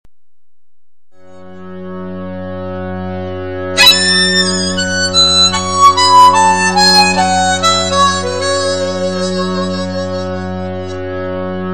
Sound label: minor, harmonica